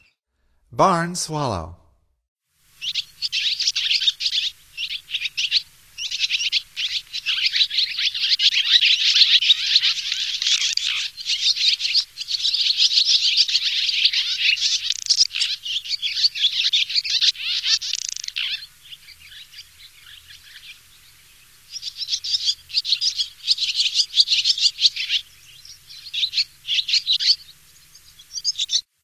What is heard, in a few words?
Barn Swallow, Songs of Birds of North America; Stokes